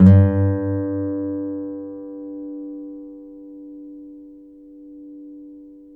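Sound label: nylon, FLAMENCO, Guitars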